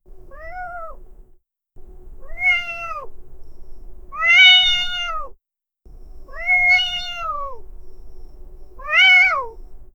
animal cat wild puma play

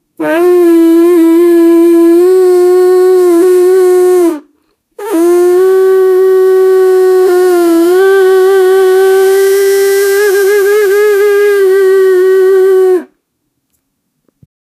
farting; character sounds; orachimaru

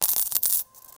vintage

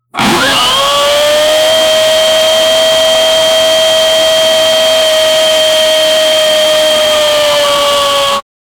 louder, aggressive

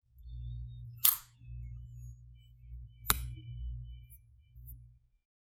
royal logo entry, royalty

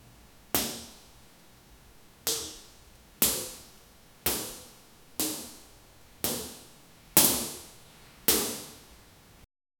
a metal suit jumping up

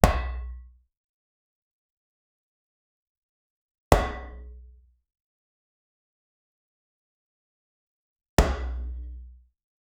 heavy impact of a hammer hitting metal, bang

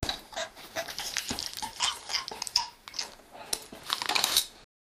feeding cake to baby

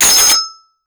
a loud knife ching sound; fps

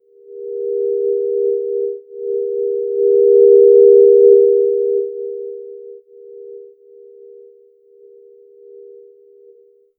futuristic ringtone

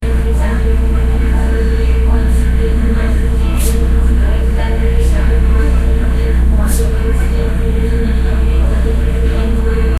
ambient house noise

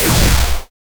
laser

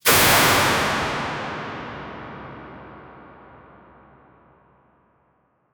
cyan pentagram energy sum

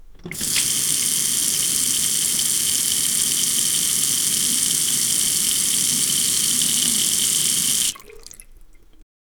sink faucet

